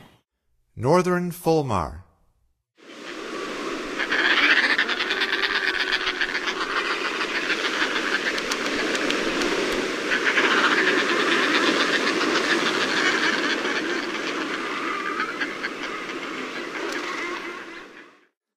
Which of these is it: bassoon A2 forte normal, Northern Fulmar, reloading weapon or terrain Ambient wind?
Northern Fulmar